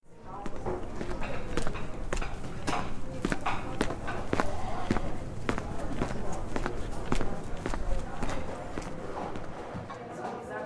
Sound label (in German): Restaurant